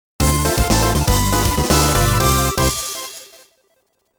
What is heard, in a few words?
Clear Jingle; NDS; English Training software